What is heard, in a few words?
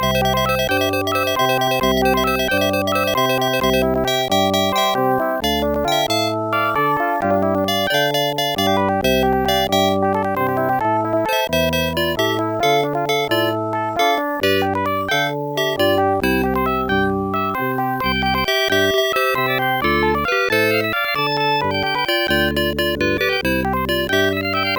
calypso song; The Music Studio